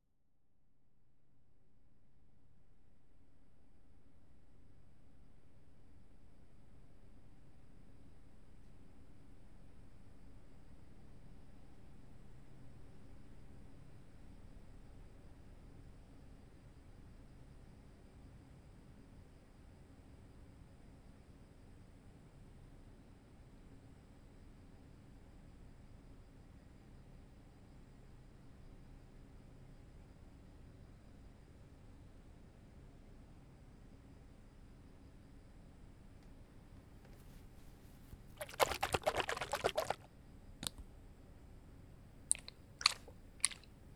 塗抹乳液、掏右耳(塗抹止癢藥膏。竹製掏耳棒); 蓄音レヱル

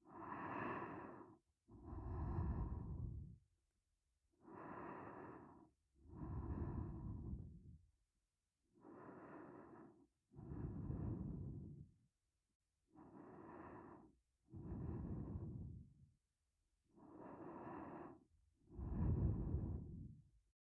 睡眠用♪ 睡息 循环 （左）; 蒂塔的睡息循环（左）; 低音奔放管家。「小少爷的钱和精子…全都由我榨出来…♪」

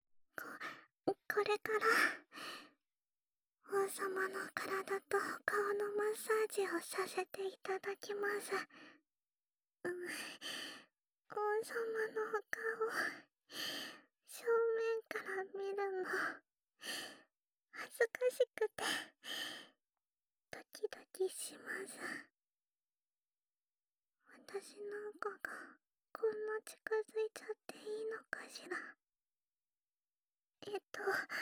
舔脸按摩; Healing of King～小奴隶的治愈全力服侍

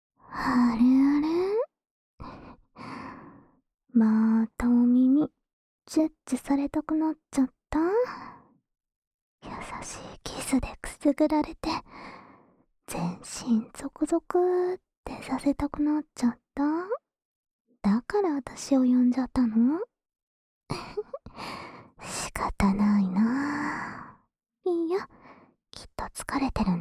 安眠用唾液較少的舔耳 右; 青春べろちゅー委員会♪ コンプリートパック

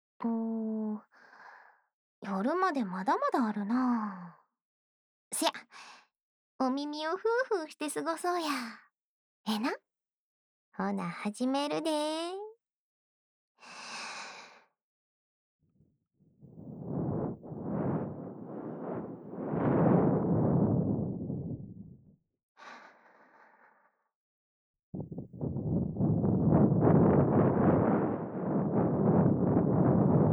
吹耳朵右; 对了……那就帮你吹吹耳朵吧，可以吧？; 家中喝酒 ASMR; 在女性朋友家中悠闲度日～202号室 关西腔的同学～

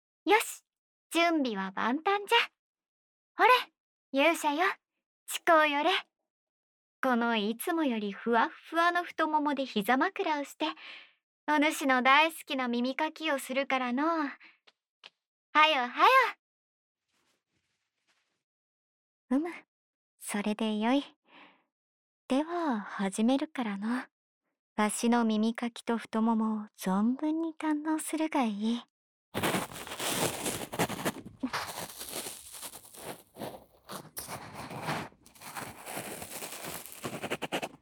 掏耳左; 附赠。也喜欢大人模样的我吗？

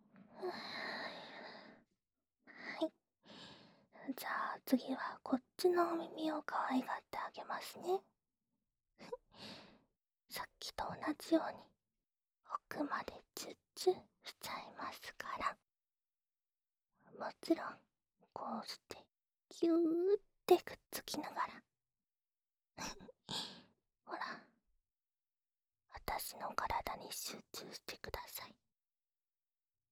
舔耳玩弄乳头（左耳）; 喜欢被这样戳吗？; 欢迎来到掏耳按摩店「春乃抚子」♪～传说中的新人，是雌小鬼！让你欲罢不能的耳朵高潮PLAY♪

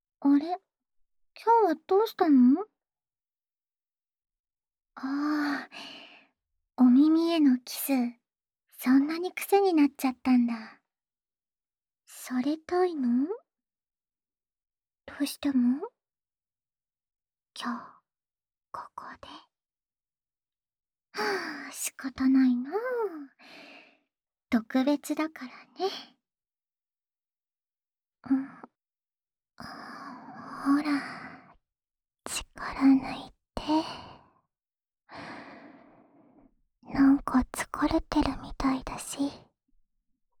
安眠用舔耳 左, ASMR

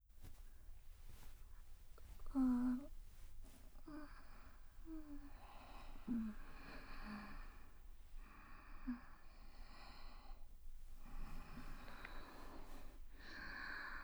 舌吻亲密紧贴挑弄耳朵; 连呼喜欢; 3P交尾; 小穴三明治3P; 和下流的阴郁系巨乳双胞胎女友的雌性费洛蒙大汗淋漓亲密3P性爱生活; 双耳边喘气双胞胎音声

